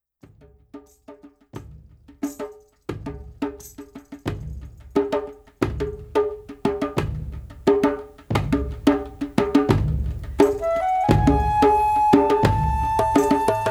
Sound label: Shaman Beat